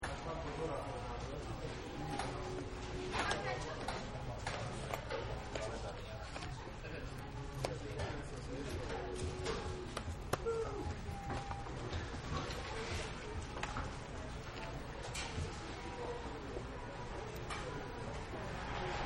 Fastfood Restaurant Amb Loop, Ambience Loop Recording Of Fast Food Restaurant; Ambience Loop Recording Of Fast Food Restaurant Eating Area, Various Sounds Of People Eating, Ordering Food And Ta; Stores And Mall